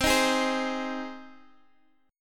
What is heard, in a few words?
G sharp Major C